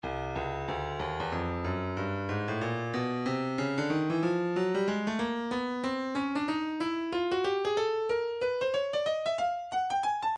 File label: breakbeat 093 bpm; Stylus; Street Stick